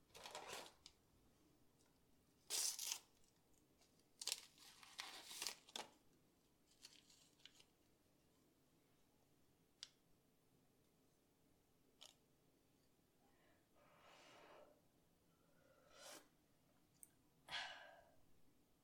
zvuk paketika chaia

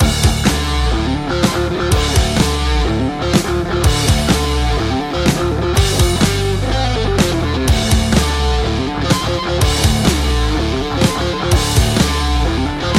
muzyka dlya igr

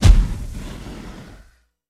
zvuk ischeznoveniya

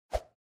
pryzhok

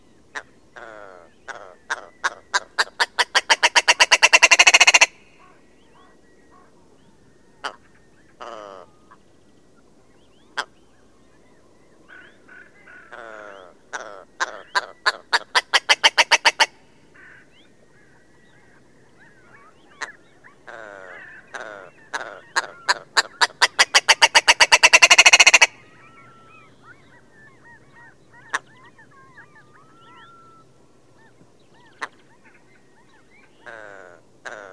kuropatki

